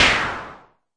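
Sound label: mbrute noise, Arturia MiniBrute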